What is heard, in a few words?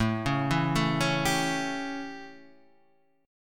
A 7th, chord; arpeggiated; JGuitar